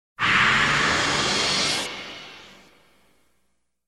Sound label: synthesizer; SOUND EFFECTS1